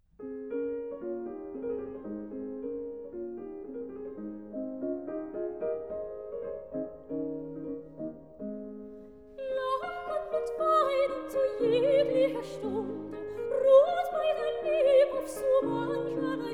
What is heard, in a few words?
song for voice & piano; Lachen und Weinen; Schubert; Lieder